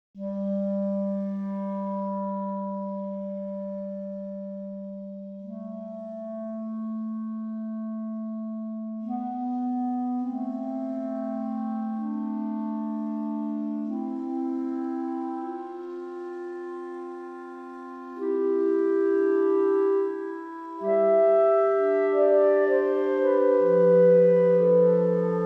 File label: Chant In The Forest; DQ BG (자연다큐)